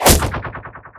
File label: glaives impact; weapons; dota; silencer